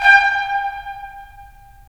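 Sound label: brass trumpets staccato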